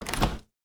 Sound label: Open Fridge; Treasure of Nadia